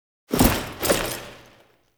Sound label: Salute Foley; Warframe; Tau; Fallen From Heaven